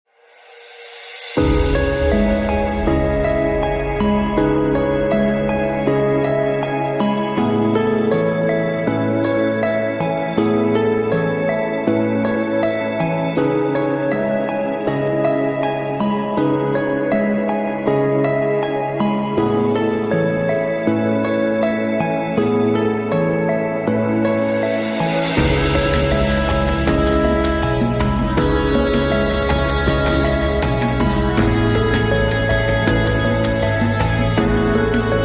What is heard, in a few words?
Field Forest